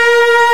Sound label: STR MIDDY, FAIRLIGHT CMI IIX, STRINGS